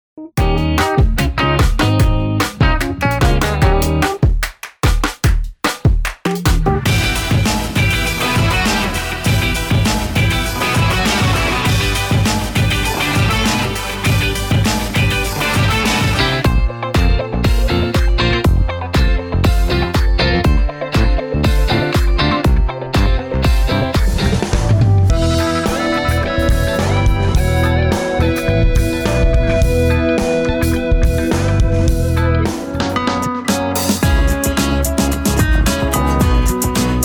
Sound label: Royalty Free Instrumental Pop